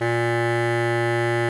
KORT H 1, kortholt; SoundBytes - Early Patches

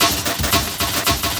Fill, Drum N Bass Percussion Fills n Breaks

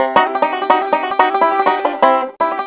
COUNTRY

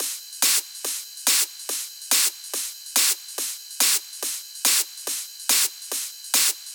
PWM Kit 142 BPM Loop, Drums & Perc; Vengeance - Essential Clubsounds Vol.5; VEC5 Demo Constr Kits; Root Gmin

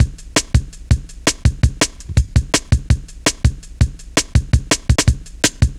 Tin, Jungle, Drum Loops 165.5; Zero-G Jungle Warfare 1, 2 & 3

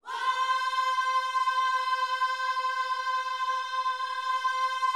WHOA C5, gospel; Spectrasonics Vocal Planet

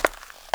CONCRETE, FOOTSTEPS; AKAI S-Series CD-ROM Sound Library VOL-6

